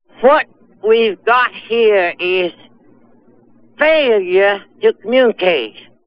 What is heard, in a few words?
failure